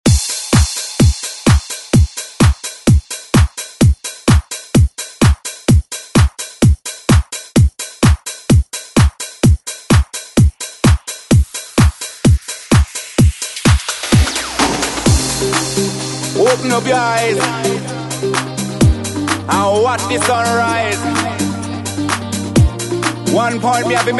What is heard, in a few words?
ReDrum; DJ Mhark